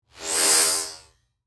Flange By, Production, Element, Flange, By; Strange Tones; Sound Design; Blast Wave

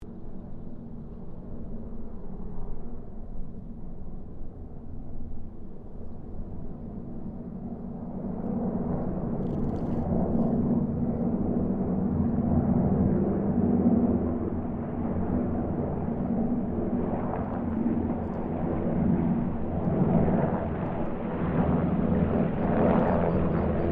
Harvard squadron 4 pass, stereo

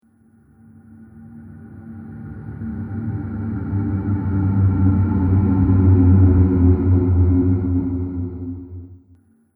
Ghostly Landing; Ghost Sound Effects; Andrew Fleming

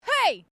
FEMALE EXCLAIM; Female vocal sounds; Exclamations; Sound Effects:Voice; Foundation3

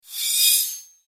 Sci Fi Transform Evaporation Disintegration

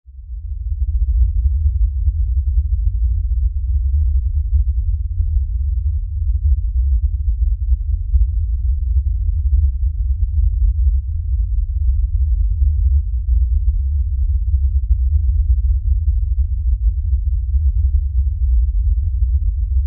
LFE Rumble, Lfe Rumble Low Bass; Rumbles; Blastwave; Sound Effects:Sound Design

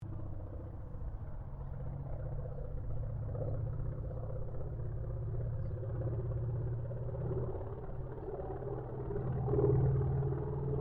AIRCRAFT CAC WIRRAWAY PASS

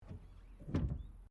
CAR MAZDA RX7 SERIES3 EXTERIOR BOOT OPEN; 1983; Cars; Vehicles

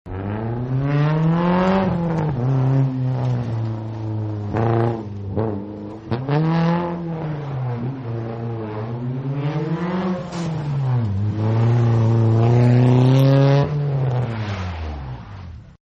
Rally Sport Drives and Skids, car; Cars; Vehicles; Partners In Rhyme

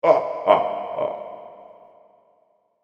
Tyrant Laughter, evil laugh; fantasy sound effect

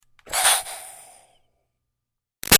Steam Release Iron Chuff Stutter; Miscellaneous Household; Sound Effects:Household; Big Room Sound

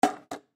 Chair Plastic, Chair Elementary School Set Down; Chairs (Sound Effects:Offices); Foley; Blastwave

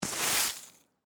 Broom Sweep Ice, Snow; Weather; BlastWave

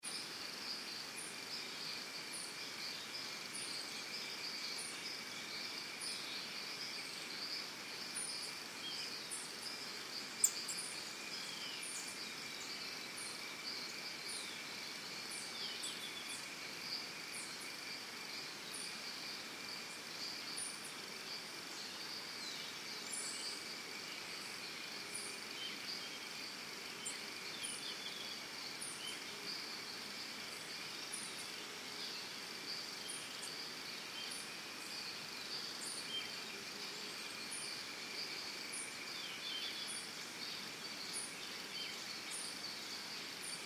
Birds Crickets Costa Rica Early Morning; Backgrounds; Forests and Jungles; Big Room Sound

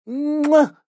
Kiss Vocalized, Kissing, voiced smooch; Human

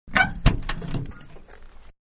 Door Open Exterior, Van; Cars; Vehicles; Van-Open Door-Exterior; Manhattan Production Music

